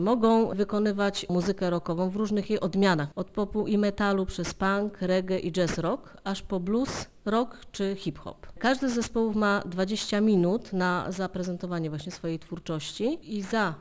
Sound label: ROCK; LIPSKO; dobry rock